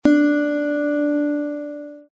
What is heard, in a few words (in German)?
Zithermacher Ton 8 LAUTER